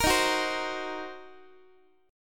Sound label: Eb Minor 6th, Guitar; strummed